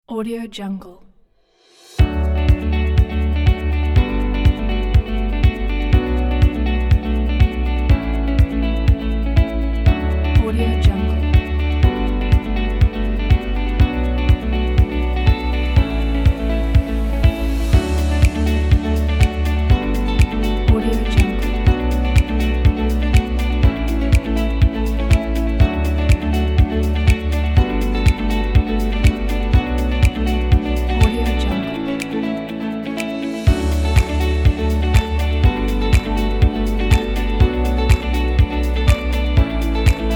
presentation corporate

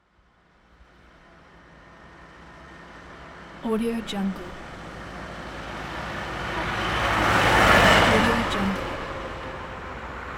tractor and truck pass by

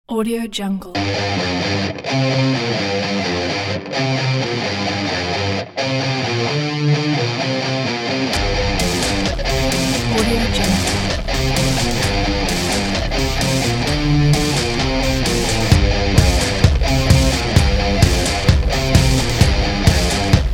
rock and dance

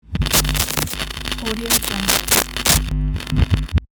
electricity

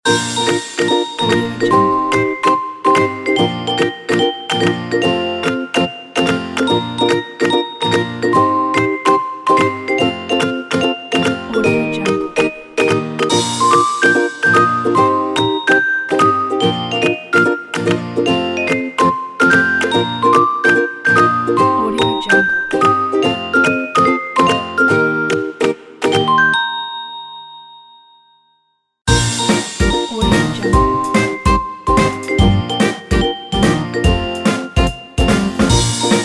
happy ident; co2-product

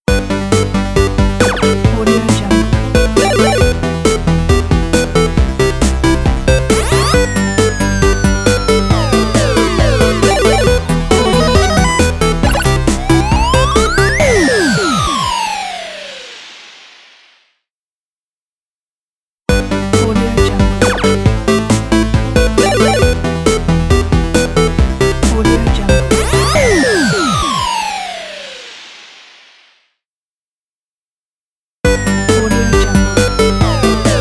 video game logo

